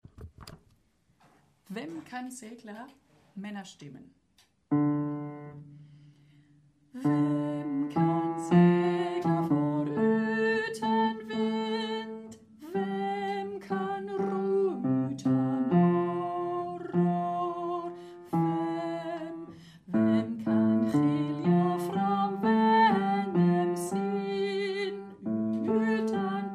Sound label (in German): Bass, Chor; Vem kan segla; chor ag